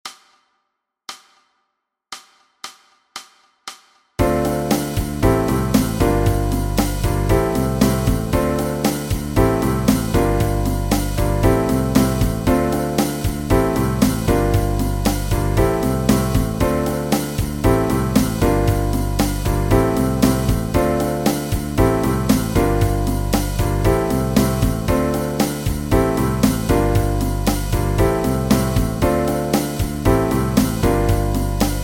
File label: Playalong Soloparts; Blosmerjet Notenbereich